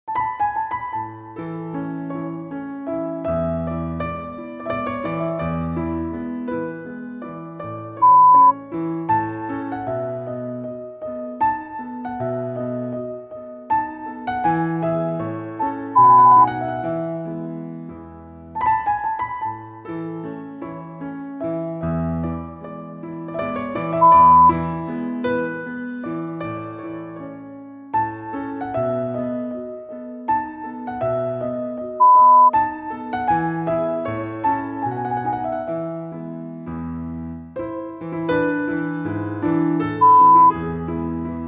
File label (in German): Klassik Klavier